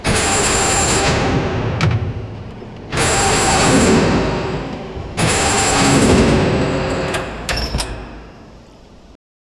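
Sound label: sound of a massive industrial, airlock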